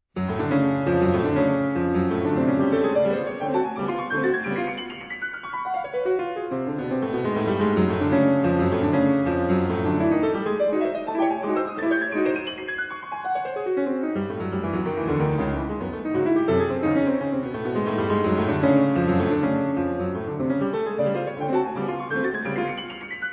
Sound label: PIANO WORKS Bagatelles and Impromptus; SMETANA; KOMPLETNI KLAVIRNI DILO B SMETANY